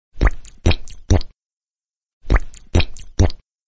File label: funny fart ring